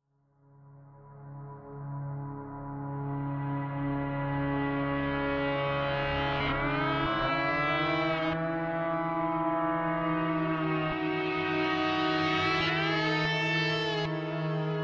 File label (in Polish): muzyka bez oplat